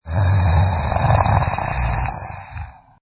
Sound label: Monster Animal Groan Short